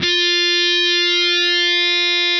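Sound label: fuzz, fuzz guitar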